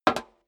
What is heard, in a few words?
step high heels metal dry single left; schritte; Footsteps; menschen